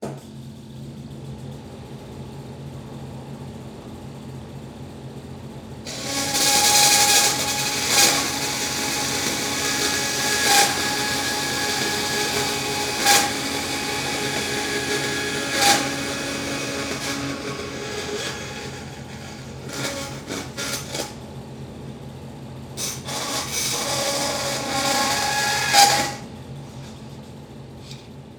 Industry Tool Saw Band Saw Turn On Cutting

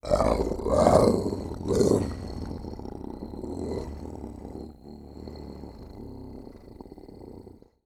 Animal Mammal Carnivore Cat Lynx Snarl; animals; AdobeCC

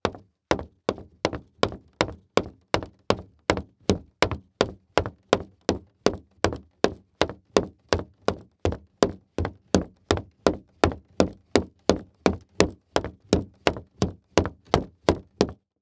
Foley Footstep Cowboy Boots Run On Hollow Wood; Foley Footsteps

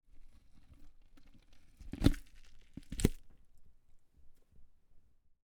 INT Cavity Cutting, Deer; Coll Anderson; GDC Game Audio Bundle

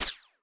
Multimedia Internet CD-Rom Flash Window Close

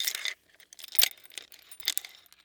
Weapon Nunchaku Chain Rustling; AdobeCC

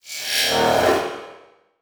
Multimedia Internet CD-Rom Flash Element

